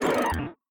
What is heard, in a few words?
vis hit